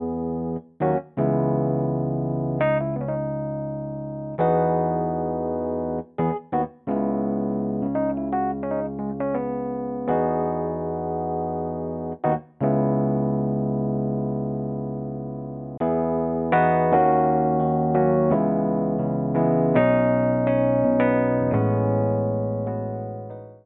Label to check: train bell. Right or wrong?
Wrong